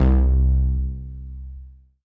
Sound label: Synth Prophet Bass C; Electronisounds